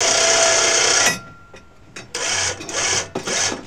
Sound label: HYDRAULIC, Industrial; SFX